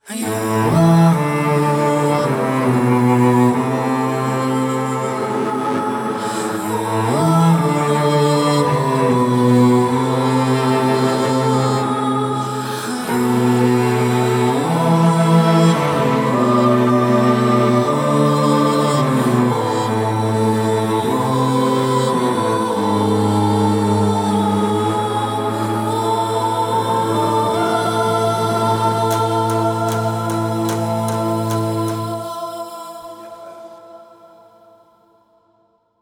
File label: Boss Fight